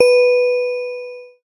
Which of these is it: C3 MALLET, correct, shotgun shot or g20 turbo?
correct